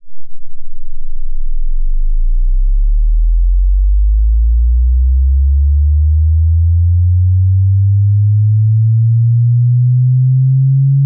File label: sweep; freq